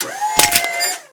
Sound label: jaws cut; civ13; civ14; Items